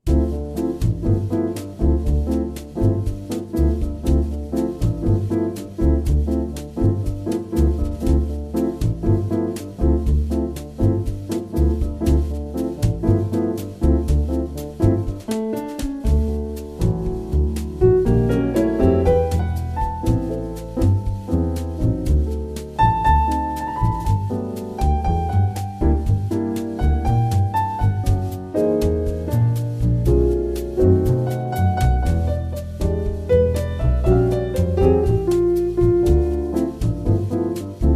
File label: Wave piano recording